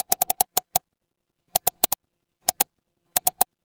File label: office mouse click